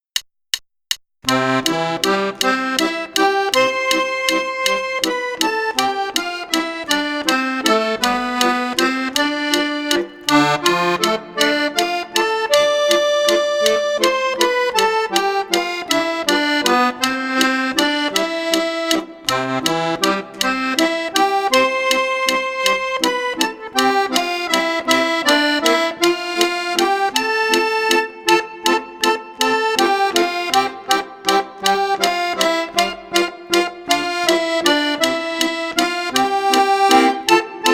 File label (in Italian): ARPEGGIATA, fisarmonica; spartiti facili per fisarmonica ad uso didattico per bambini